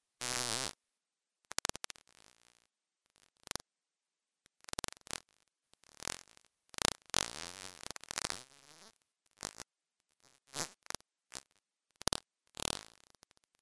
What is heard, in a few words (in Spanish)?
sonido vaquita